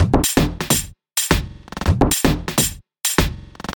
tech house glitched drum roll loop; drum loops

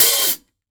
airy open hi hat d sharp key

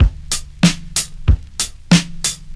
94 bpm breakbeat sample D key

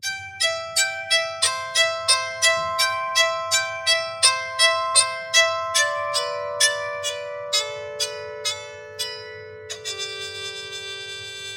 soprano psaltery melody sequence, am, 126; instruments; loops

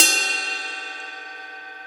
long room reverb ride sound f key

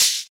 shaker sound c key, Loudest frequency: 5891Hz; percussion

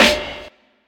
Crispy Acoustic Snare D Key

